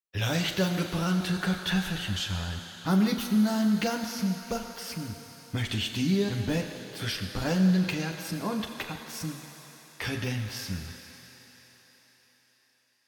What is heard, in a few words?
Eventide SP2016 ST Room Modern; OpaJott